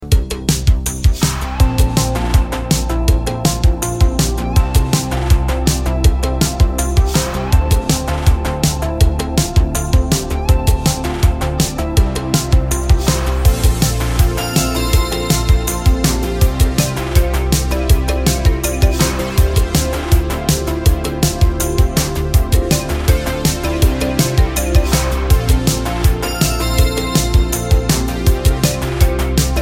instrumental

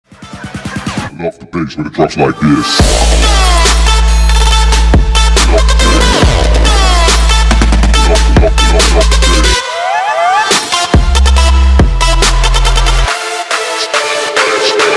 i love the bass

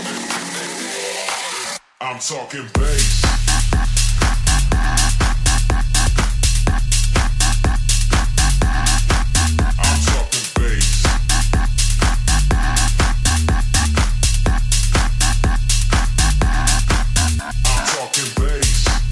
talking bass

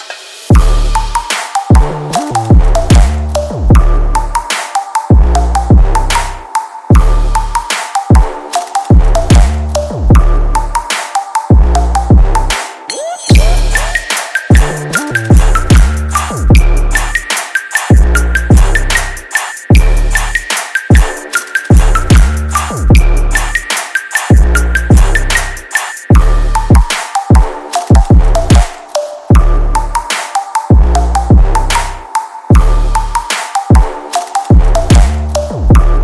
aqua trap

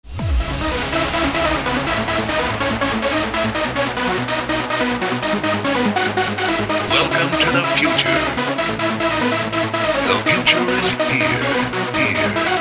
bad drums future

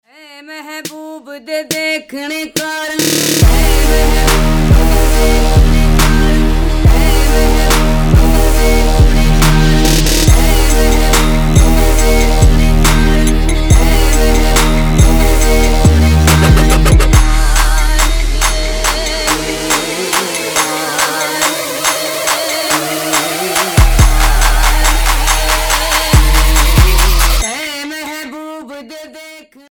arabian trap bass

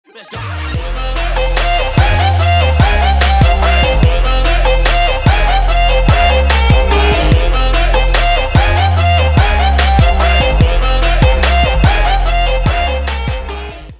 k pop

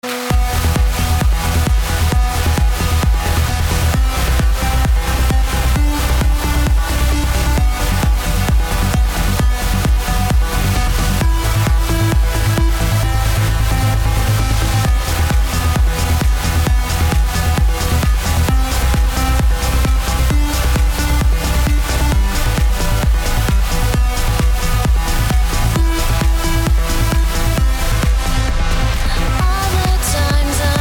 remix

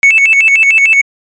classic ringtone

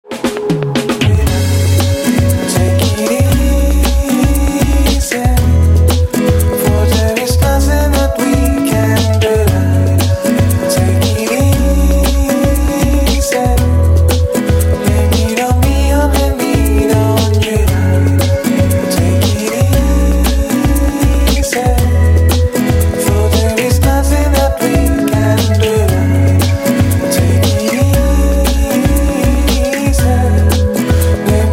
lounge version

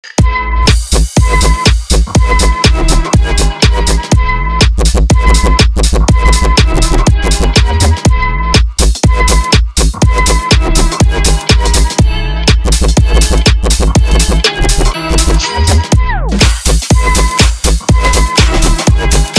bass house

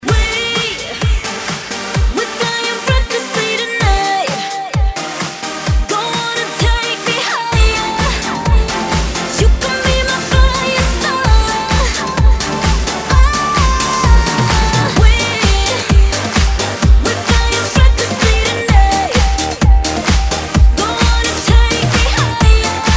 pop mix

